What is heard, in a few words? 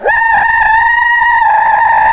scream; slackware-3.0; games; abuse-0.31; sfx